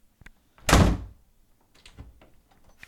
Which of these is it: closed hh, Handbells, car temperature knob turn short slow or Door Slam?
Door Slam